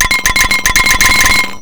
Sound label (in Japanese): korokoro